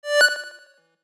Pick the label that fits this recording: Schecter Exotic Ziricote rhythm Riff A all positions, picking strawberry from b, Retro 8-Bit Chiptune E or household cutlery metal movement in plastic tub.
Retro 8-Bit Chiptune E